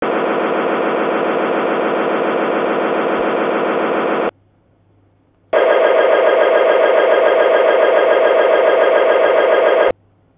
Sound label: diesel engines